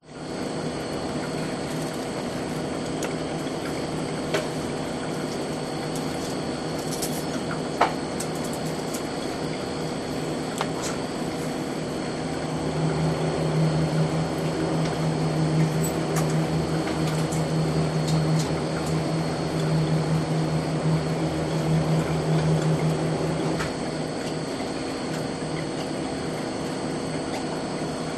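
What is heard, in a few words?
Convenience Store Ambience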